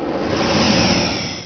jet; Amiga Soundtracker Sample Packs

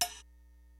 LIVE AC KIT, DRUMS; Kid Nepro; Elite

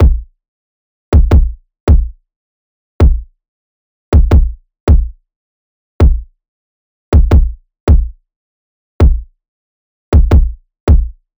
loop kick 160; cybele

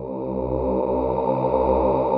CHORAL PADS; Pro-Rec - Synthsonic; Z1 PADS

